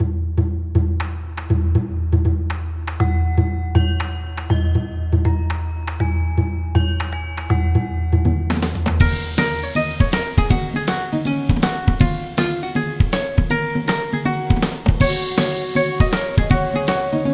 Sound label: subgame dance song